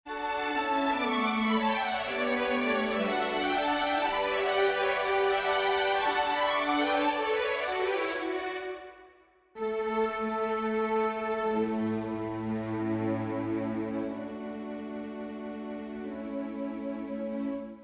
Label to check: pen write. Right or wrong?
Wrong